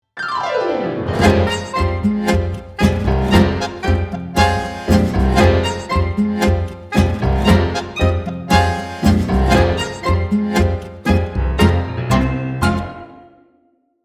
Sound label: Tango